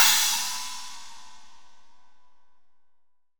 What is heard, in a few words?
20 CHINA, 20INCH CHINA